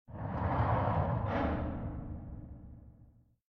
Gate Goes Up

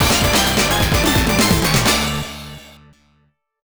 Stage Clear; Undercover Cops; Irem M92 arcade

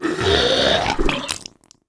Leviathan Spit

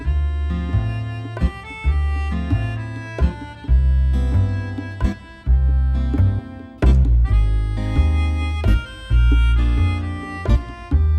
bgm loop 132bpm; hometown; chicory a colorful tale; ps4

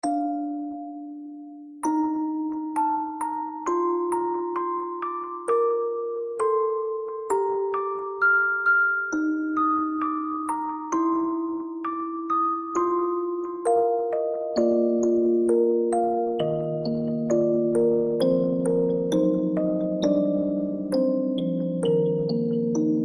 bgm sad; My Maid Girlfriend Romance You Choose; android

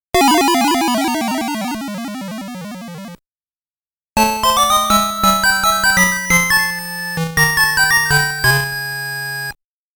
Miss ~ Game Over; fantasy zone

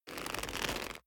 Leather Foley; The Town Sam; Devour 2021 gamerip pc macos

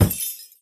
Daily Gift Collision; Angry Birds Rio

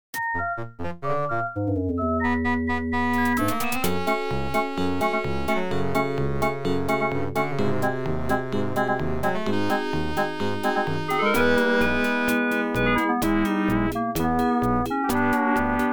Gameover

